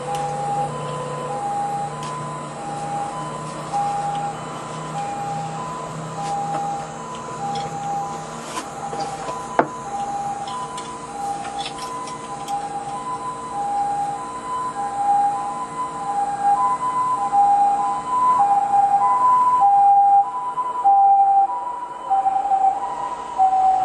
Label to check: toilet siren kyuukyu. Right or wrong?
Right